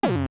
Magic Cape Off; Turn the Magic Cape on and off; Zelda

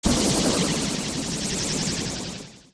Ball; Phantom Ganon; OOT

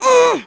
Struggle; Link struggling (trapped in a barrel, etc.); Zelda

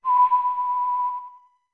Whistle B long; OOT

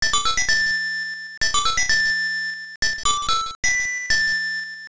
Instrument Bell; The Sea Lily's Bell; Sirens Instrument

